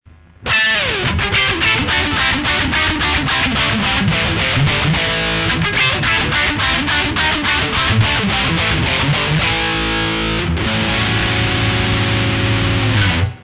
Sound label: pm lead beginner; fretjam